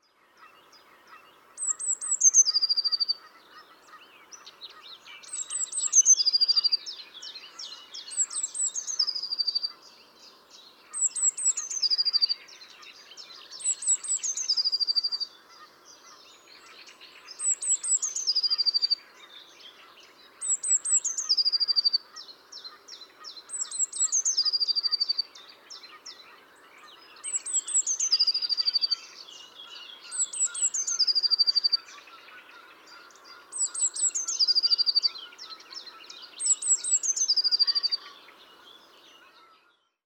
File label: Cyanistes caeruleus; SETE